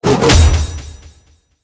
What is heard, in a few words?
Victory Attack; Susano; Smite